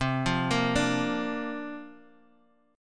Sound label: C 7th Suspended 2nd Suspended 4th, C, down, Guitar, Half Step Down